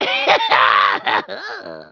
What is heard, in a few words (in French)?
rire; echo